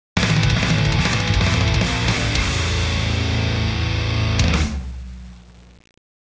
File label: won round, musickits; csgom; cstrike